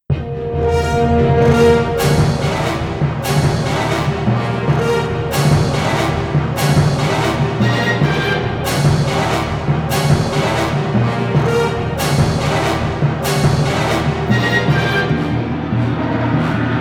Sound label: Roar; Monster Hunter 15th Anniversary OST 2019; Rathalos